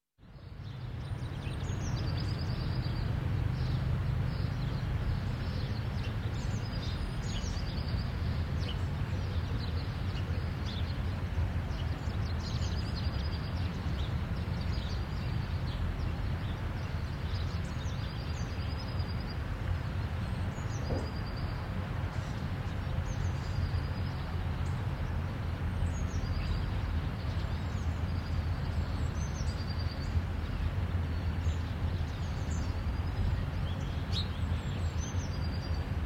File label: Residential Ambience; Sound Ideas; Series 2000